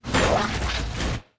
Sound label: damage, monster